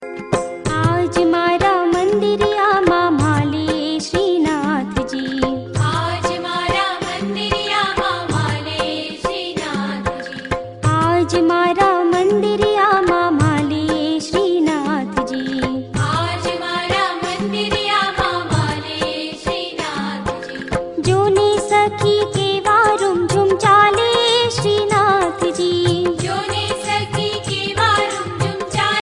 gujarati ringtone

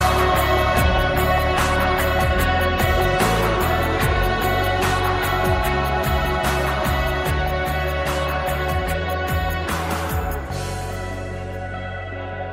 romantic maldive ringtone

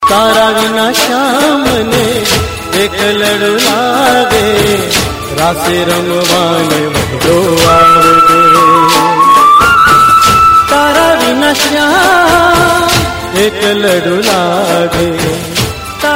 gujarati ringtone

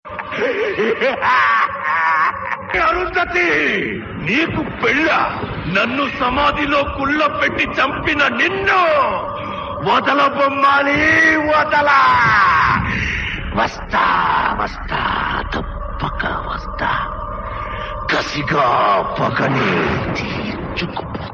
telugu ringtone

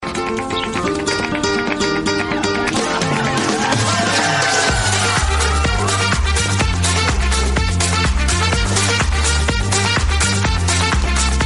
russian song

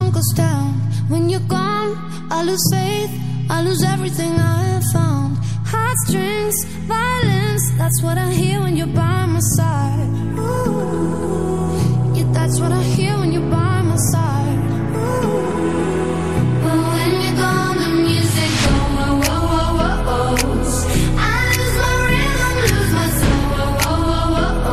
song

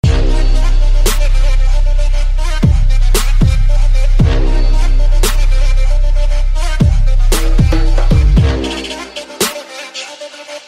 trap

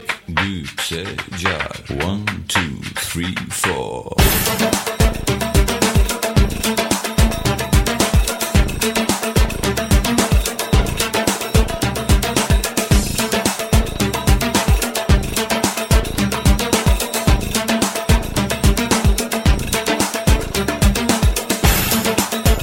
arabic techno

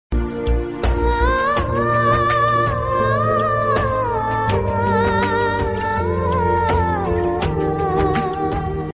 sad female; ringtone; gajhni

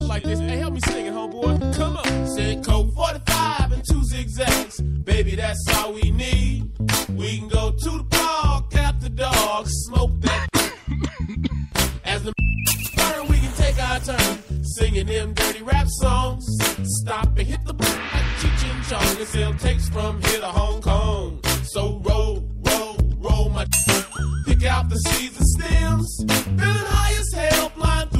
crazy rap ringtone

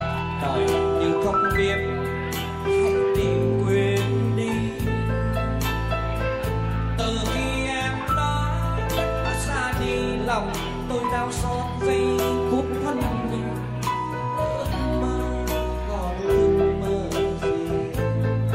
vietnamese love ringtone

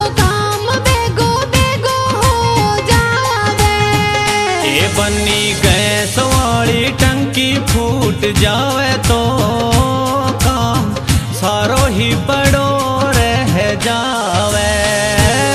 rajasthani ringtone

